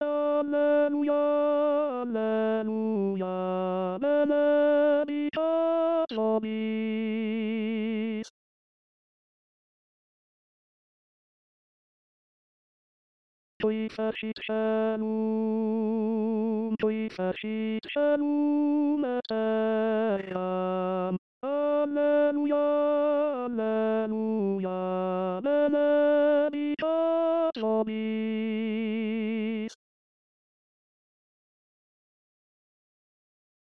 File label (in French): tenor